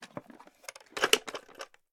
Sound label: Open A Treasure; LOOPERS